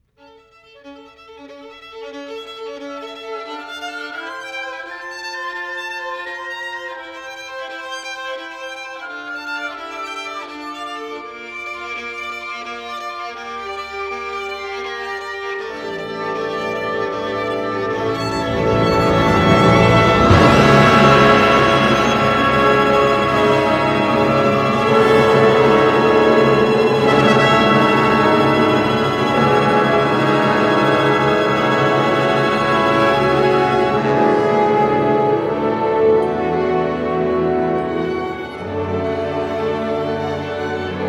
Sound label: Violin Concerto